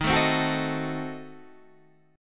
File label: Eb Diminished 7th Eb down Guitar Standard; Ebdim7 strummed; JGuitar